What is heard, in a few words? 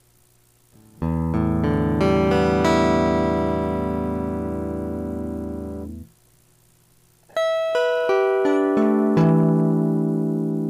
defeat